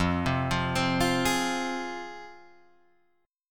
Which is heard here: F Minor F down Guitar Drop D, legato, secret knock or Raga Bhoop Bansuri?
F Minor F down Guitar Drop D